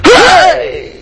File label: death sound; kung fu fiunn